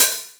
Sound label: Open Hihat, Cymbals; Vengeance Samples